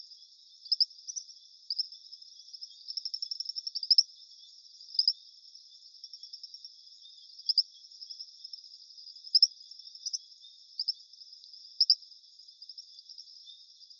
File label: E brittoni, coqui